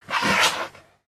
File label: baby spider attack idle; creatures mechanical